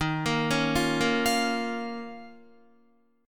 D sharp 7th Suspended 2nd, Guitar; D#7sus2 arpeggiated; JGuitar

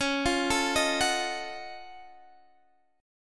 Gb Minor Major 13th Db

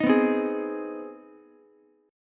C Minor Major 7th Sharp 5th chord, Ukulele; strummed; JGuitar

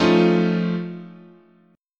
F Augmented 7th, chord

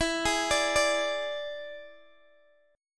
E 7th E down Guitar Open G

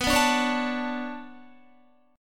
B-Minor 9th B down Guitar; Bm9 strummed

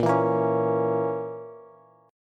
Bb Diminished Bb down Bass; strummed; chord shape

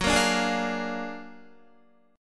F sharp Augmented Major 9th F sharp Guitar; strummed; JGuitar

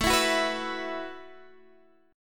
B 7th Suspended 4th A

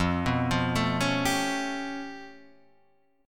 G 9th Flat 5th F

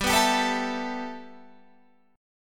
G 6th Add 9th G Guitar Standard, chord; G6add9 strummed

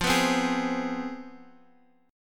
C Major 7th Suspended 4th Sharp 5th, F, chord

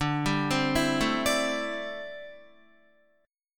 D 9th Suspended 4th D down Guitar; D9sus4 arpeggiated

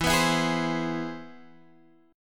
C Augmented Major 7th E, chord

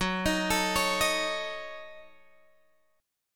F sharp Minor 6th F sharp Guitar Open D

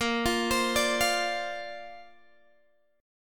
A sharp Major Add 9th, Guitar, Open G

